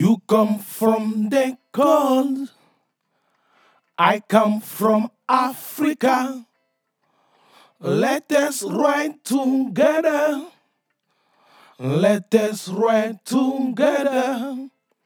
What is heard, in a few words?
vocal